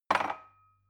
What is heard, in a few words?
glass put down cupboard wobbly